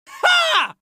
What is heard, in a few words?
Wild Laughs Male; Vocals & Wallas; 1001 Sound Effects